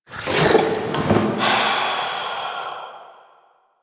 Slumps Dies; Warframe; Entrati Labs; Cavia Rank Up Scenes; Tagfer

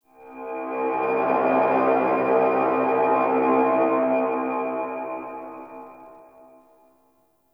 Clan Dojo Synth Rand; Warframe; Dojo Randoms; Ambience

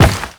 Step Rock, Footsteps; Characters; Warframe; The New War; Kahl

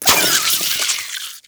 Water Sprayer Last Shot; Warframe; Weapons

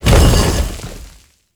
Roll; Warframe; Qorvex

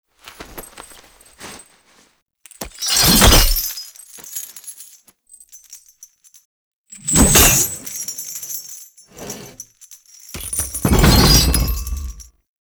Flail Swing; Lab; Lyon; Warframe; Tau; Protoframe Room; Ambience

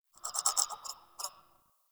Tree Critters Angry; Duviri

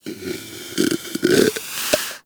Predator Snore; Warframe; Hunting Critters; Characters

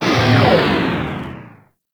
Proj Large Fire; Warframe; Lizzie

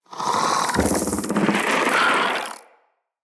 Root Spider Elite Vocal Chatter; Animals; Spiders; Vocals

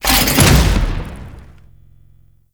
Heavy Block Ability End Stomp; Warframe; Duviri; Dax Heavy; Abilities

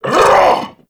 Vocal Attack Short; Warframe; Duviri; Dax

